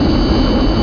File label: JET; Frogger 1997